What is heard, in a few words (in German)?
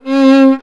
GEIGE; Best Service; Alpine Volksmusik